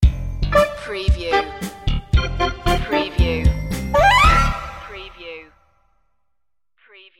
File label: new funk sting